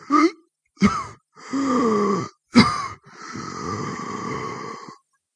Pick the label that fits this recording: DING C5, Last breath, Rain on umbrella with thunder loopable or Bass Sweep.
Last breath